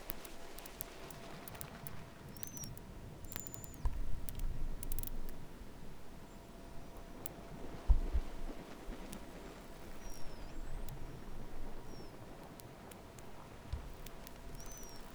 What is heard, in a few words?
Whistle; Falkor